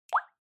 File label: Water Drop Low Dry